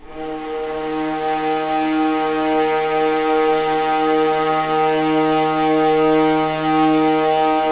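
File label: D#1 legato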